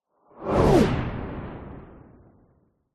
spaceship or rocket fly by fast

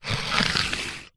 office ruler plastic 15cm scrape on wood

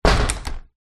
household front door close glass panel old

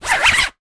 sport badminton racket cover sleeve zipper; zapsplat

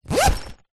lunchbox fabric zipper; foley

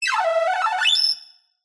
synth space weird sci fi melody activate bleeps; Jessey Drake

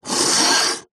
beer can empty slide table; food drink; zapsplat

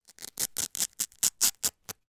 shin pads velcro rip; foley

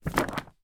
paper pack put on surface

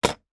toy plastic wrench put down on plastic workbench

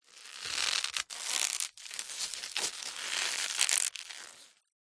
rope old stress creak sweatener; foley; zapsplat

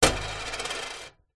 foley money coin set down spin metal table drawer; zapsplat

